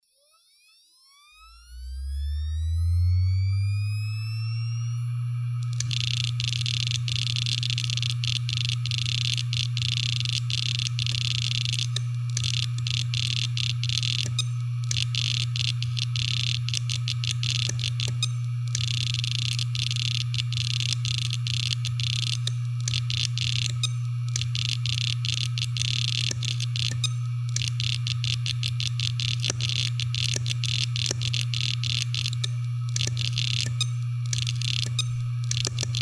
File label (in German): Samsung SpinPoint F1 HD502IJ 500GB HeadCrash Festplatten Geraeusch